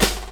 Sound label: Dance Drums